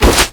damage; tenma

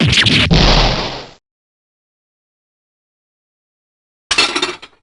breakdown

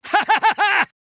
laugh; monosuke

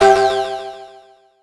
happy mad shock

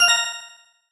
coin; ralsei

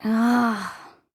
sigh; aoi

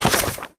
page turn

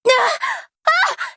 shriek

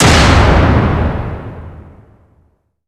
letter; thoughtroute

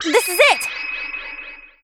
climax break; kaede

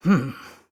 "hm"; sakura

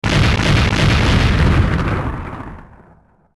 gavel x3

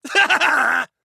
laugh; monokid

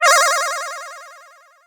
warpzone; sonic

